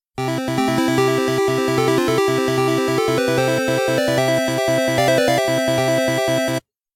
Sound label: fanfare